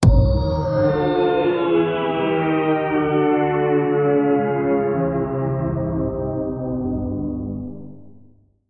slow anime power up

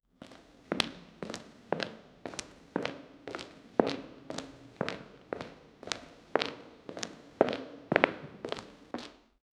slow footsteps of a man, walking away

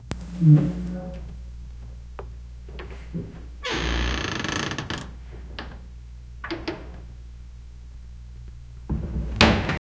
old haunted house door, haunted house

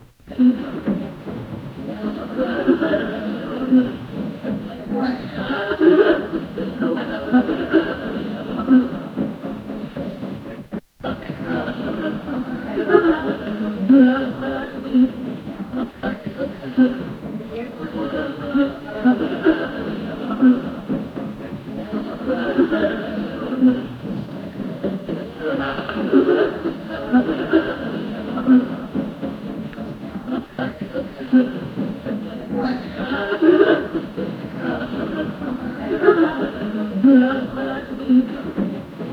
group of people murmuring, village

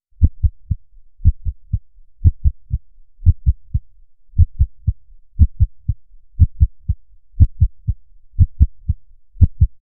faint echo or heartbeat

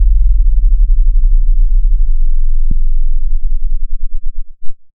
rising synth; electric

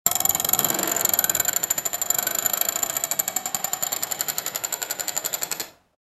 a game show sound

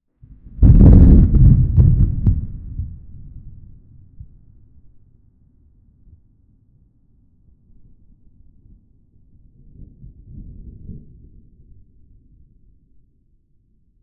thunder in an open field

